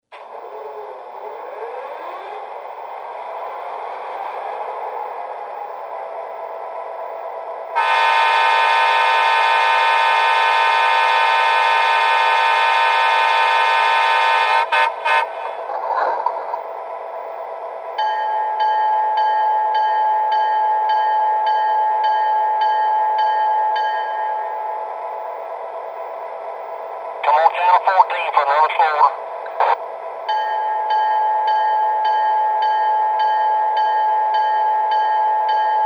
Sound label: sd40 2, trains